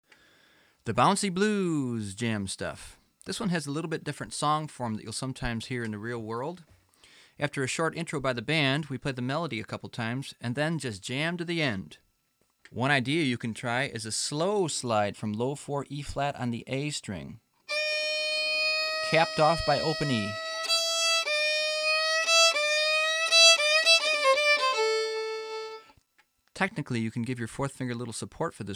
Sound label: Bouncy Blues Jam, fiddle; Bouncy Blues Jam Stuff